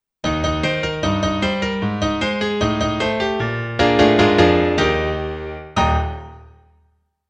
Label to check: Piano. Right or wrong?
Right